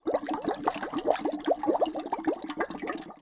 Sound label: Long Straw